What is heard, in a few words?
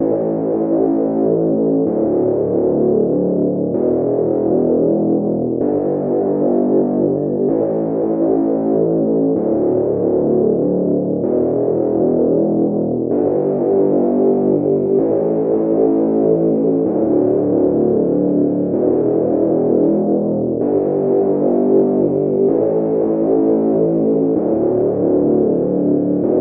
keys, jazz loops